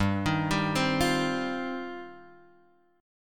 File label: G 7th Suspended 4th Sharp 5th G